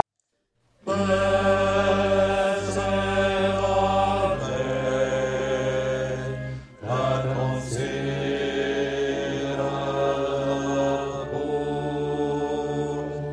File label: Bass